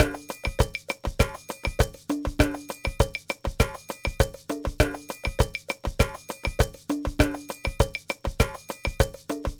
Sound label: Ensemble, Latin Percussion; Sonic Foundry (Sony Creative Software)